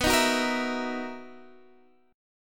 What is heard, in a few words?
B Major 11th B, guitar